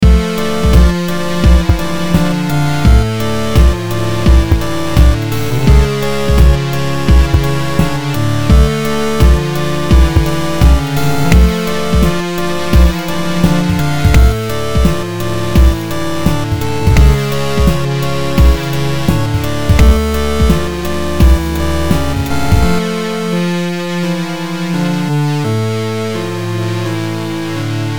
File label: 8 bit dungeon; gae wild jam